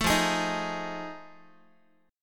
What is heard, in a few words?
F 7th Sharp 9th, Guitar; F7#9 strummed; 12,13,11,13,x,x